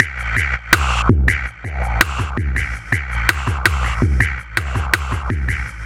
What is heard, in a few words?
BASSOMATIC L, LOOPS